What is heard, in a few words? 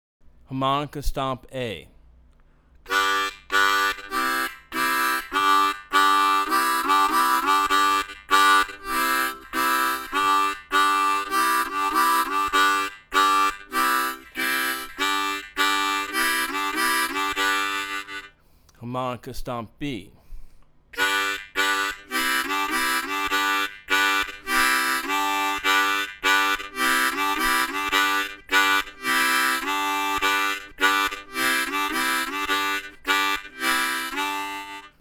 Harmonica stomp A B